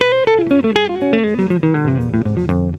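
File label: electric guitar melody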